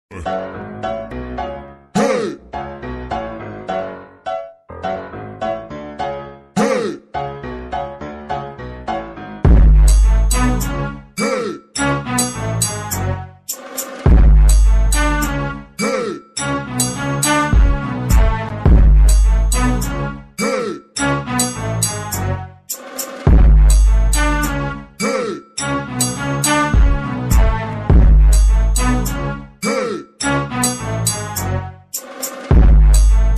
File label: phonk funk